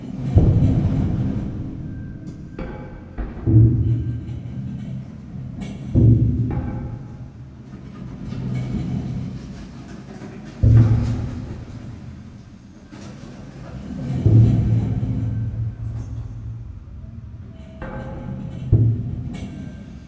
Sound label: ambient ship wreck idle; Stellaris